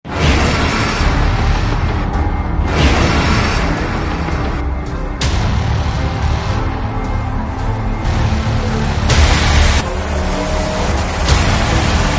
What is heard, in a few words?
Bgm Ringtone; KGF Chapter 2